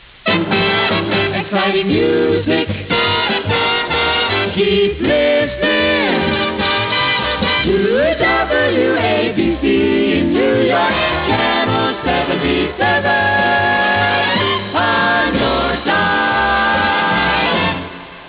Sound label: exciting music